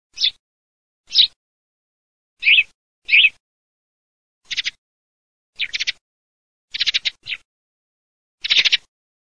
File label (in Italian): PASSERA MATTUGIA